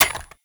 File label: Bird Three Single Step; Warframe; Entrati